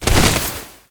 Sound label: Winged Mount Death Underlay